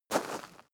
step gravel single; schritte; menschen

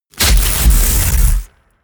electricity; comedy cartoon

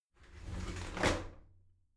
shower slide doors open soft; objekte; tueren

